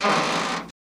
Metal Scrape Quick, boat creaks & bangs

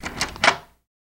Dead Bolt Lock; lock & keys

